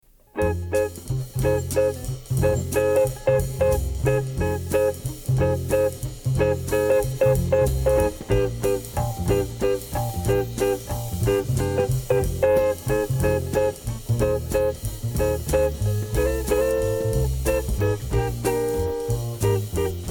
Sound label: The Perfect Jazz Collection